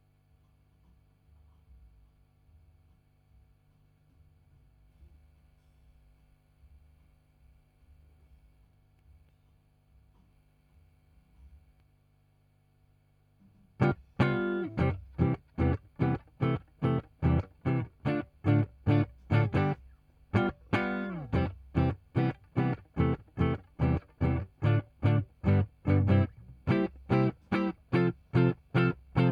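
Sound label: Guitar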